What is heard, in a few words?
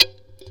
Djembe cheap mic session